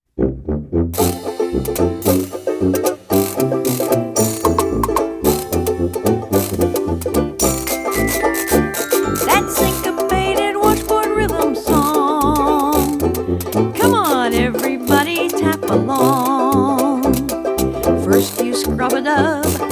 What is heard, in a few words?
Synchopated Washboard Rhyt